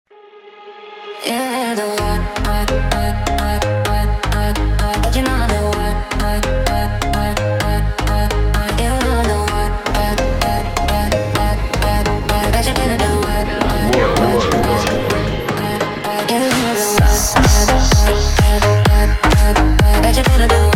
pancadao automotivo dance comercial

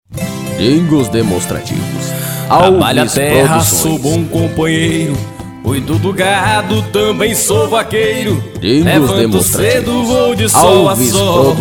jingles cercas; alves producoes; bahia

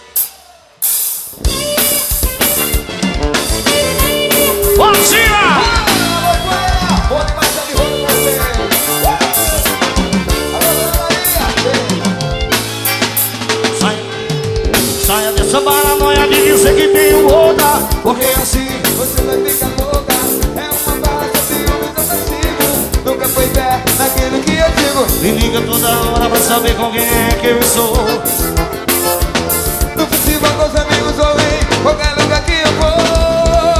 ao vivo, forrozão

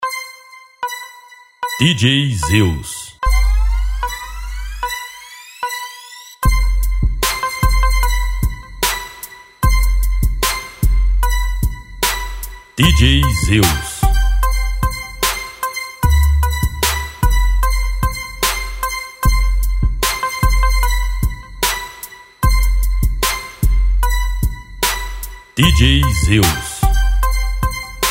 base do novo rap musica

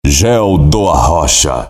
vinheta

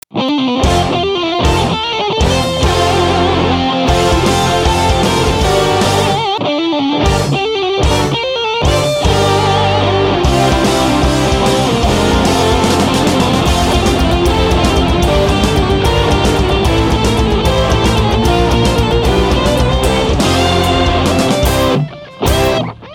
Hino Nacional Instrumental; Francisco Manuel da Silva, Joaquim Osório

